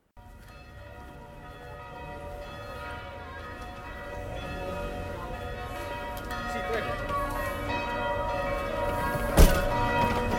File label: Rome S Andrea bells evening